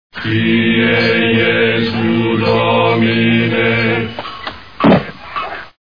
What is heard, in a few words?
chant; montypython